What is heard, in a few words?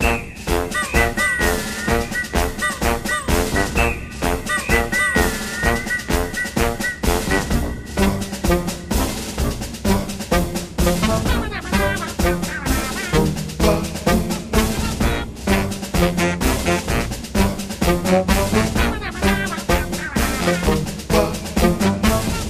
Music Free Spin Loop; sugar Parade Desktop; Mahi Gaming